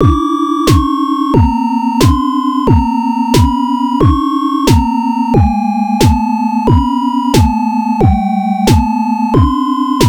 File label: super beat